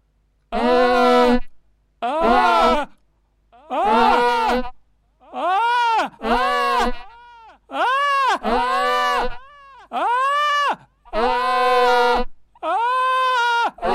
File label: Scream